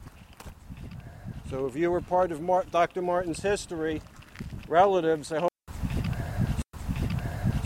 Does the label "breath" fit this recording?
Yes